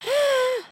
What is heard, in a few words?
raincloud; emotes